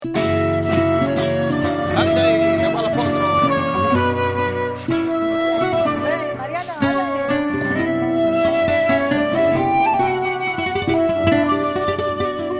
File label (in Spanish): farruca zapateado, flamenco flute